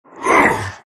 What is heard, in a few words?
shards pain; terrorblade